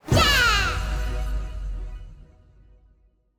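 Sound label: sfx general mission accomplished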